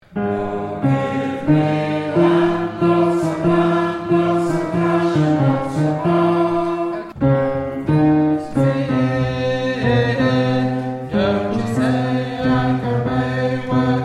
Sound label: Alto